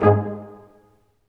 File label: BRS, Ensembl stac; Roland L-CD702